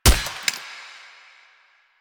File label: Shot Initial Mech, Weapons; Warframe; Acceltra Prime